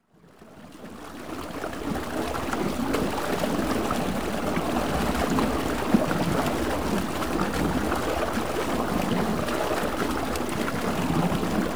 Rivers And Streams, Stream Flo; Rivers Streams Water; BBC Sound Effects Library

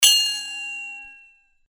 Sword Sound; FXHome; Fighting Sounds

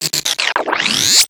tape fx; Sci-Fi; 3maze

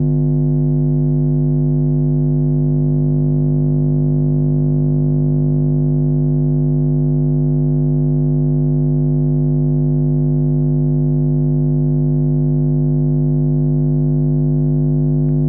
Circuits Electric Hum Low

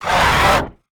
Large Monster Roar; David Dumais Audio; Monster Sound FX Pack 2

